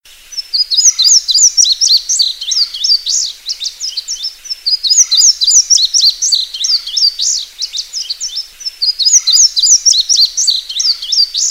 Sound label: bird